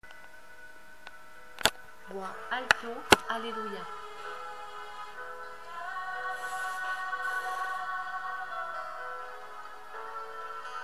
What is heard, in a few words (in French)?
voix alto alleluia